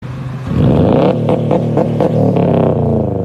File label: Subaru STI